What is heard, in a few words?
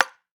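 Mexican TAMBO, Latin Shakers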